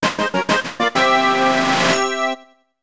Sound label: Star Catch Fanfare; B3313; n64 windows; Vanilla